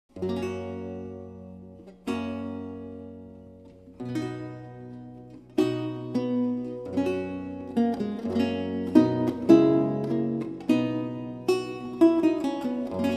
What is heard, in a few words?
Recercar, on renaissance lute